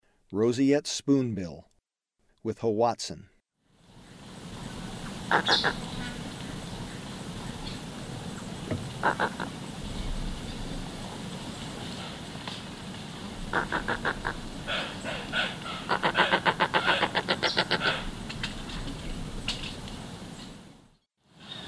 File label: Roseate Spoonbill; S. America; Eastern Lowlands